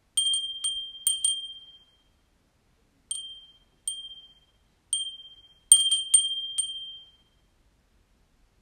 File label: windbell